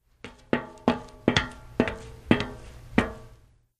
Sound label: Footsteps Female